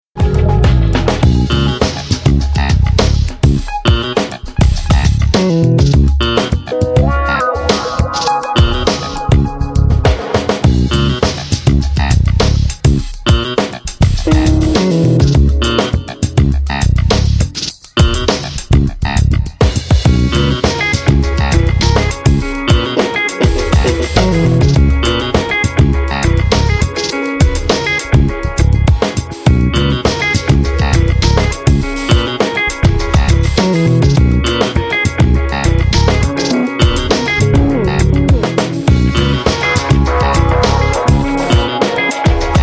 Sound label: funky; team legat